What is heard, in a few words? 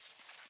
reel spin; jackhammer